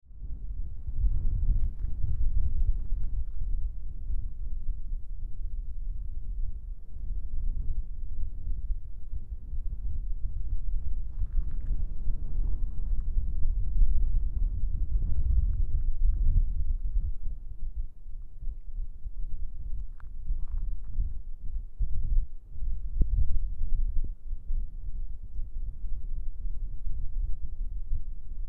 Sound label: wind and dust